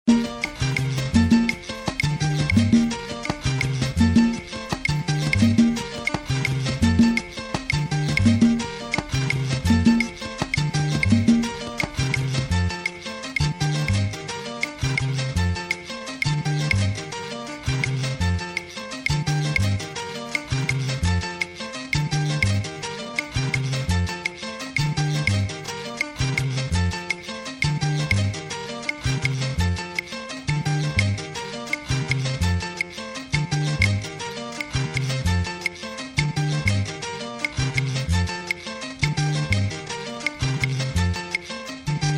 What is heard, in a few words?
clave montuno tumbao conga; Interpretación musical para bailarines